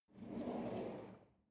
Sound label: drawer cutlery draw out